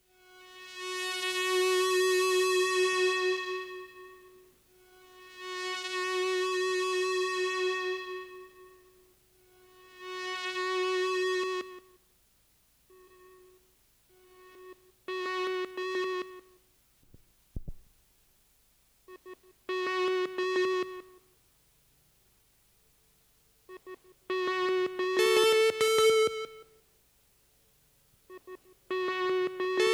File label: Loop And Solo